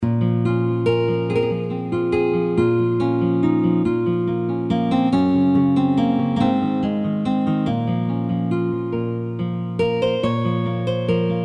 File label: Celtic Dream Modern